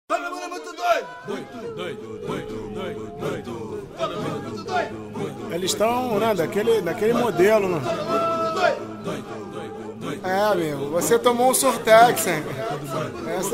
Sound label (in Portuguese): Vinheta; Sequelândia; Todo mundo muito doido